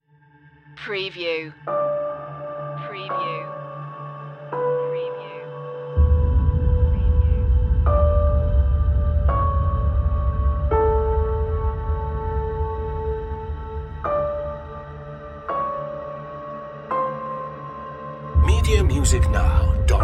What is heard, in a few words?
piano intro only